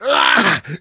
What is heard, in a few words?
hurt major, survivor voice; source engine; left 4 dead 2; namvet